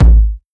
kickdrum sample g sharp key, kick drum tuned to the G# note. Loudest frequency: 108Hz